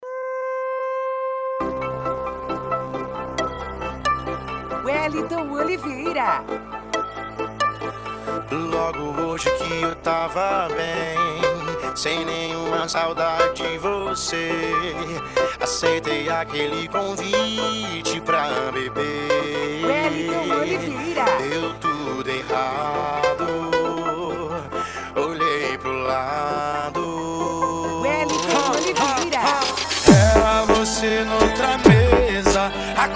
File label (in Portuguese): funk tumdum e mega funk